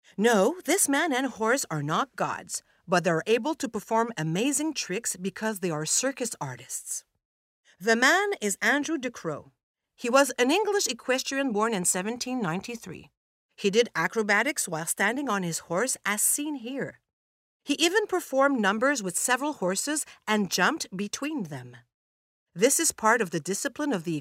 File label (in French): clairon; circus disciplines